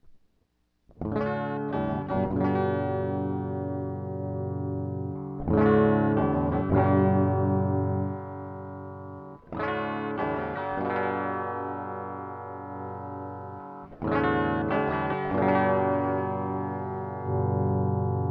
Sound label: Bridge Various Settings d g em strum